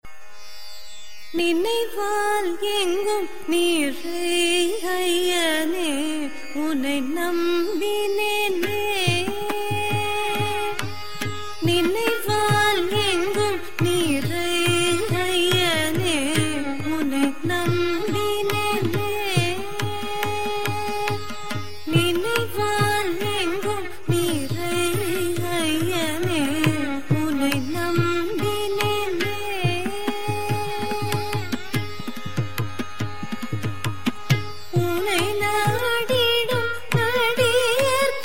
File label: Vocal Individual